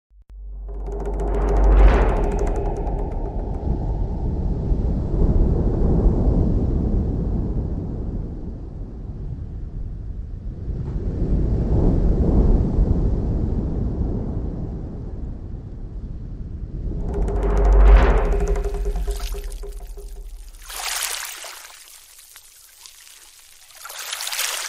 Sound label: waves surround, sound fx; boom library